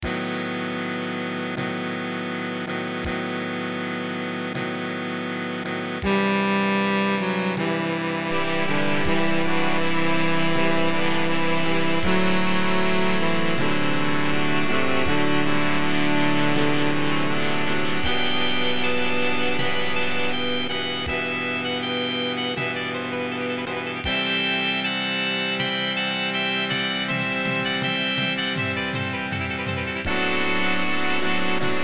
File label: MIDI